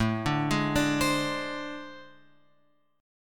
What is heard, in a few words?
A Minor Major 7th Double Flat 5th; AmM7bb5 Chord {5 5 6 7 X 8} arpeggiated